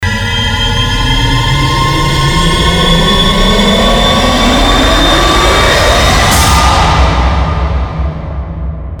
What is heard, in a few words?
Rise Sample